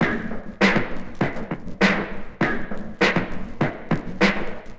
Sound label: Ghost Loop 100BPM, drum loop